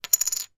foley chip token plastic down on table

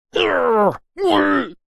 human male fight vocalisation karate; zapsplat

